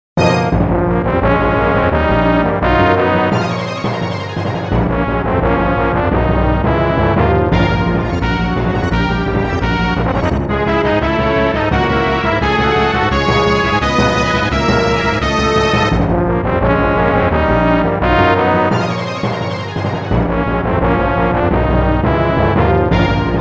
Boss battle

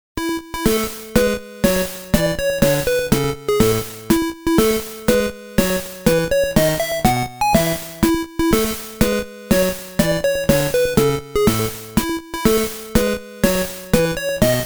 Victory Fanfare

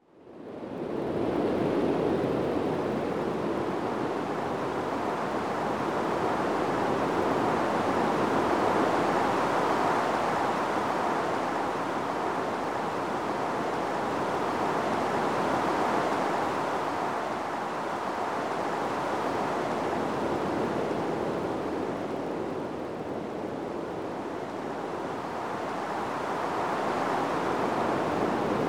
Wind

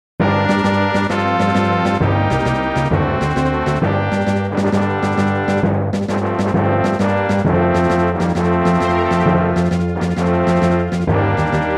Victory minor

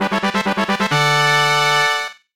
Level Up

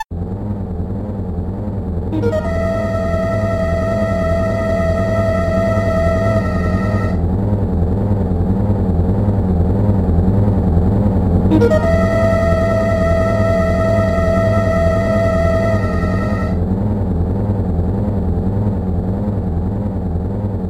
Wall Stairway

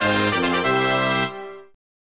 Level Up

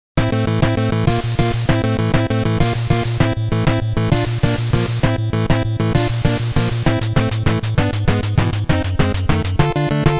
Battle 1 Player

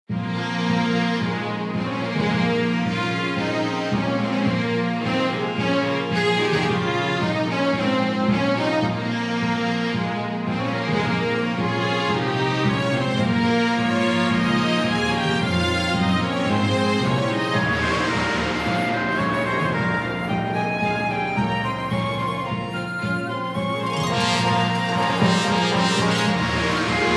Hymn

